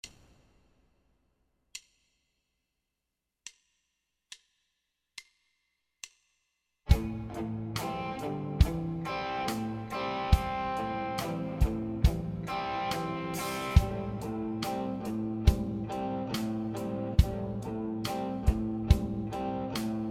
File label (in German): 70bpm Bass lernen für Anfänger Bassline Playalong